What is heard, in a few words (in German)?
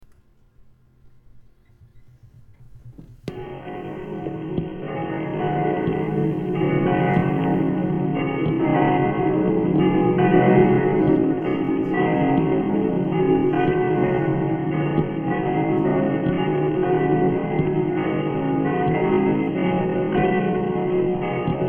Glocken